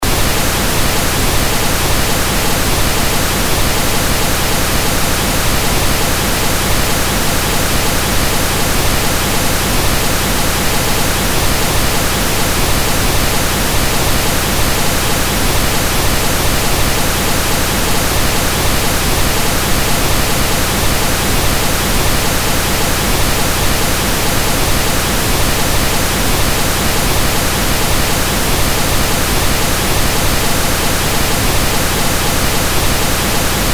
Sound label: Pink Noise; test tones to help set up the amplifier in your system